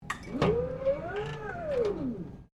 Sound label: FORKLIFT HAND TROLLEY OPERATE STEREO, Hand operated fork lift trolley; Construction Vehicles